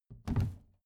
plastic trash can open regular

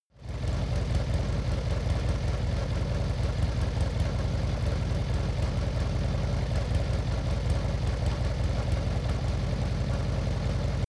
motorcycle harley very slow steady

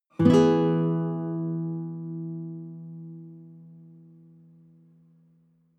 nylon guitar chord high major

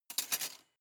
cutlery large drawer rattle

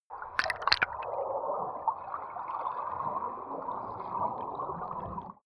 canal

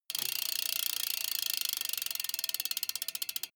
bmx bike freewheel push and slow down short

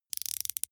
plastic wind up rattle small fast

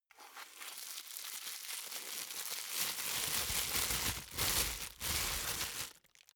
plastic bag unfold

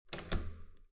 metal door heavy handle down

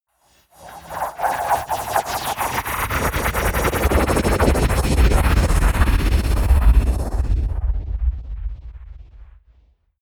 whoosh flutter

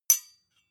sword hit clack soft

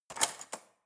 cutlery fork drawer handle grab

